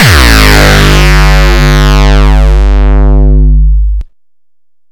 Bounce Kick

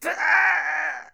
Pain

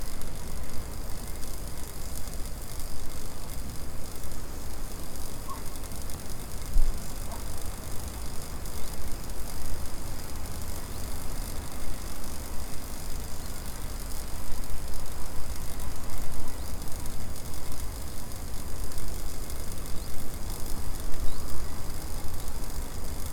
High voltage line

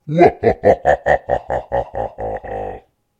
Evil Laugh

